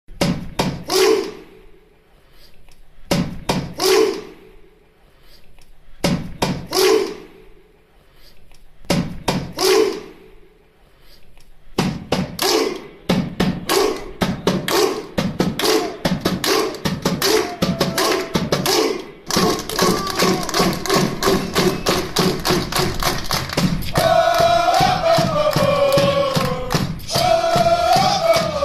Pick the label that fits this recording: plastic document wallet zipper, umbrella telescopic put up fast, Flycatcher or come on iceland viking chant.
come on iceland viking chant